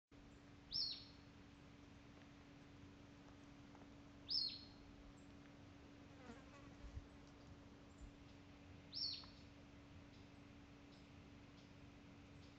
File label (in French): Élénie siffleuse Elaenia martinica